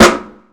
old school thick steel snare drum sound a sharp key